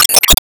Swingpower Shot; Disney Star Smash; Android, iOS, Mobile

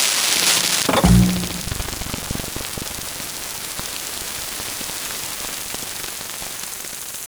Slot Shutdown; d4dj groovy mix; android

